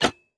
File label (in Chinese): 铜活板门开关声